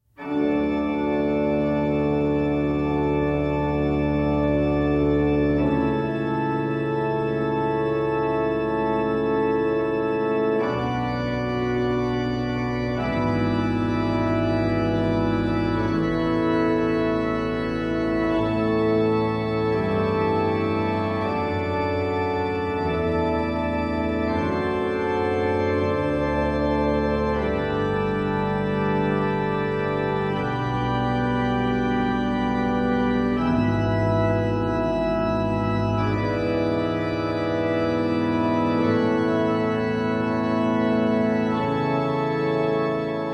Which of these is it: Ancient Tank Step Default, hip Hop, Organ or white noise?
Organ